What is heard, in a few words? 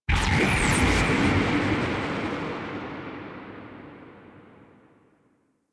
Power; Nova; Warframe; Vitruvian Museum; Warframe Powers; Leverian